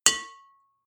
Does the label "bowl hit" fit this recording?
Yes